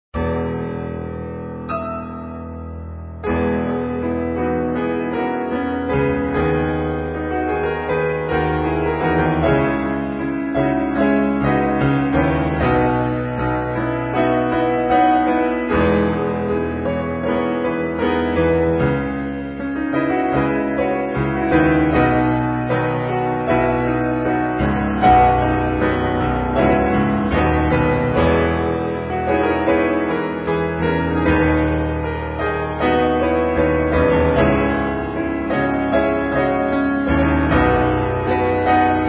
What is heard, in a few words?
piano